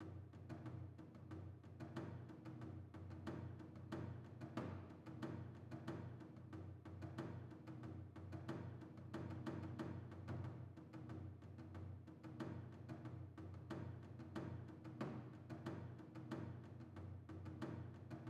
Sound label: Odaiko; starbites